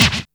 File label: DR-660 Perc, Drums, Boss DR-660